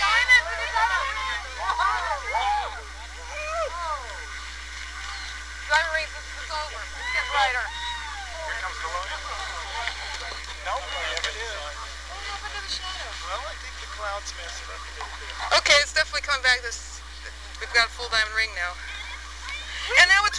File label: dring